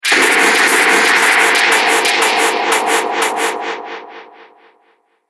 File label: Epic Impact Delay, explosion; Miscellaneous Impact Sound Effects